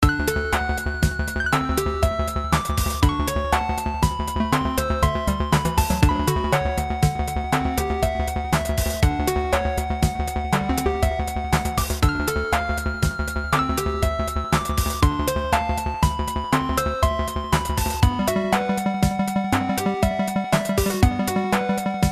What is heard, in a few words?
Polyrhythm